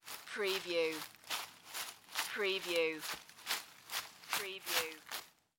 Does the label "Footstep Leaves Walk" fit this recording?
Yes